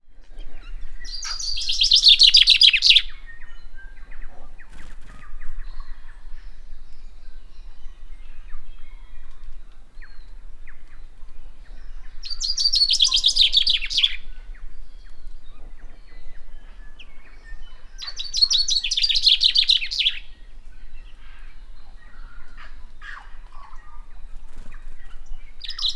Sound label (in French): Chant Pinson des arbres; Le pinson des arbres